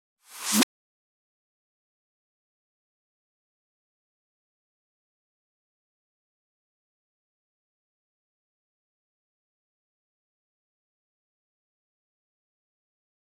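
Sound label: 128BPM FX Reverse Snare; Vengeance; Essential Clubsounds Vol.5; Amstertaco Kit; Root Gmin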